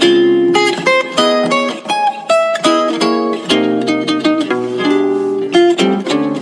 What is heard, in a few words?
guitar, Loops